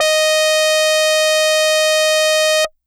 Hard Brass DX100 Ds5

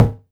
FM Perc C2

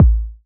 KICK A

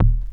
MonoEvolver Sub Bass Vinyl Synths C3; vinyl perc

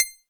Old Banjo DX100 C7

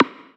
Rim

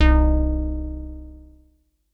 SYNTH Mini Note

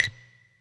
Clave Basic Verb

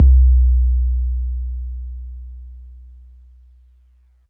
BD 808 MPC60

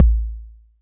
BD; TRX

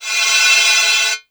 Crash Cymbal

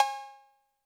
Cowbell 808 Color

